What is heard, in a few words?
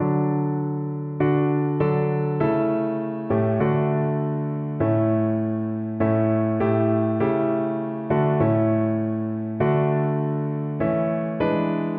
my faith looks up, piano